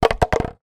household small plastic empty water spray bottle drop concrete